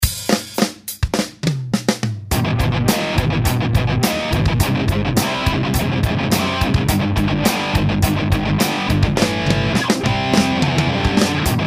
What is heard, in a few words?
Intro Instrumental